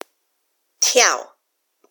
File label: jump